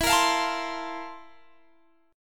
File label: C 13th E